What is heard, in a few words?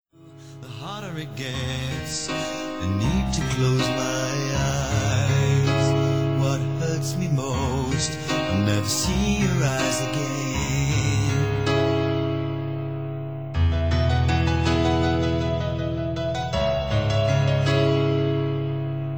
Piano song